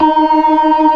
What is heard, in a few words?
ORGAN, NO PERC